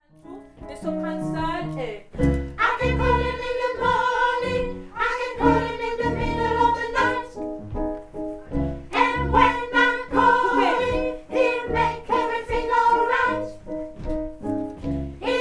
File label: soprano